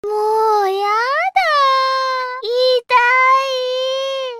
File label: death; csgo